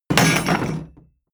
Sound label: wood impact metal rattle hard